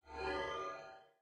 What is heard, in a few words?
idle swish sickle; smite; osiris